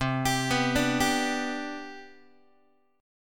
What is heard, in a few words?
C Major 7th Suspended 2nd C down Guitar, chord; CM7sus2 arpeggiated; x,8,10,9,6,6; JGuitar